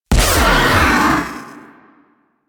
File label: Nullify Activate; Warframe; Teralyst; Ropalolyst; Abilities